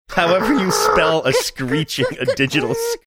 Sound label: making modem handshaking sounds